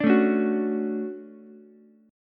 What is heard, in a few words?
D-Major 7th, D, Ukulele; strummed; JGuitar